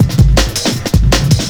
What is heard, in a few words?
break